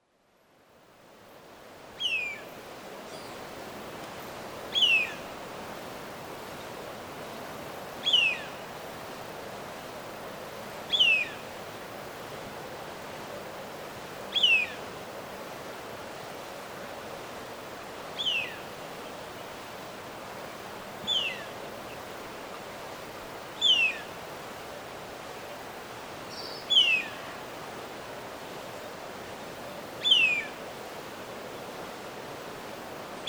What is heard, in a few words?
Gavião pernilongo Geranospiza caerulescens; Jacauna